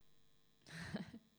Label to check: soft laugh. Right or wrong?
Right